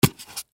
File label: Styrofoam Wig, Styrofoam Wig Holder Pick Up; Foley